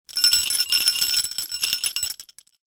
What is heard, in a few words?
jingle bell